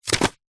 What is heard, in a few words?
magazine cheap set down carpet; household; zapsplat